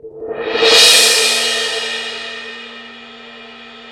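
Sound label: Suspended Cymbal Crescendo; Digital Sound Factory Virtuoso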